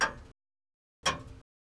clock; Mafie 1

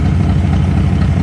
ford V8; Mafie 1

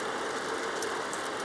vent; Mafie 1

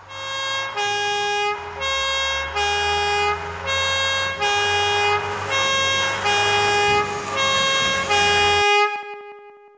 up fire; Mafie 1

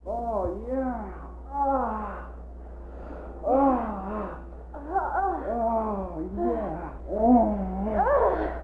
sex; Mafie 1

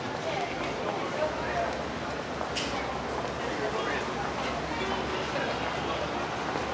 ambi town; Mafie 1

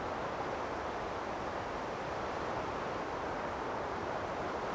out forest; Mafie 1

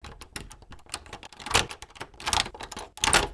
unlock; Mafie 1

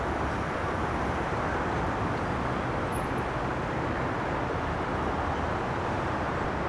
town; Mafie 1

